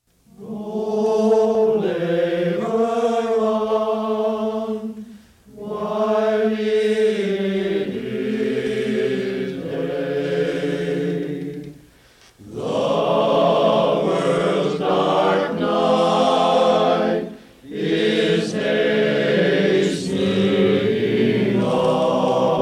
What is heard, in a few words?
miners memorial hymn; mining